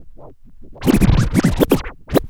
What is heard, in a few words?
Scratch, Trance; Sonic Foundry (Sony Creative Software); Trance NRG VOL-1